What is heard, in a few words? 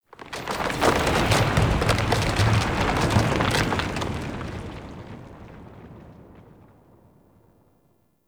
Earth Quake Rumble; Warframe; Tau